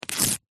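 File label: household instructions pamphlet glossy paper rip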